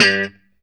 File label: POP G 2 F; Spectrasonics; Hans Zimmer Guitars VOL-1; JC-STRAT POP